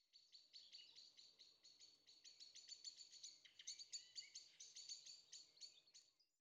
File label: blackbird; FontainebleauDemo; MidDay